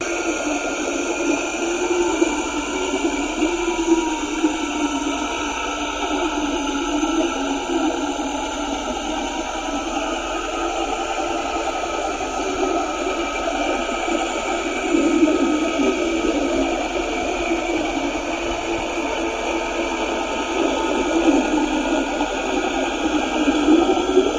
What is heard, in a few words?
Torpedo Jet By, torpedos